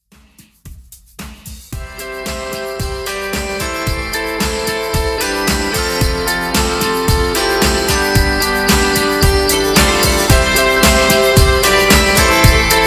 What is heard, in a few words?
Christmas ReDrum Uptempo 112 BPM